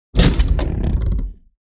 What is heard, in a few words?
Chaotic steampunk impact with mechanical movement; gfxsounds